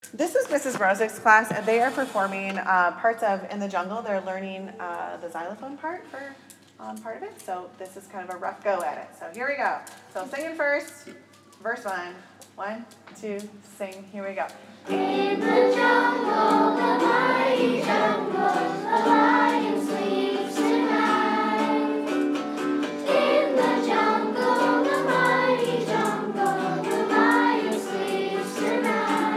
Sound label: jungle xylophone